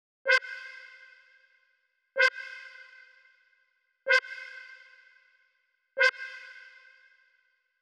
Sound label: 126BPM Cutted, TechHouse; Offshore; Vengeance Samples; Bonus TechHouse Kits